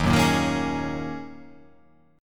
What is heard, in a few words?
D sharp Minor 11th D sharp, chord